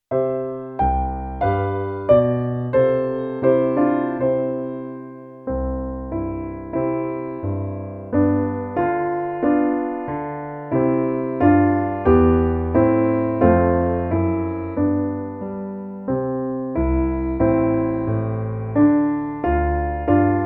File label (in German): Kleiner Tanz in C